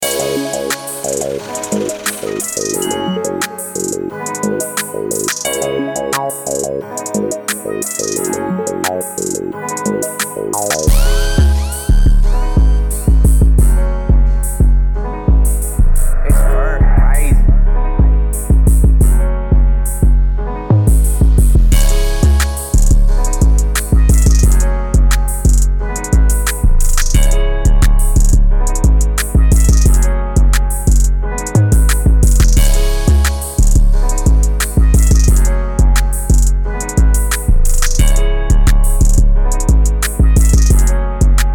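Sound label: tranquil 177 c minor, detroit type beat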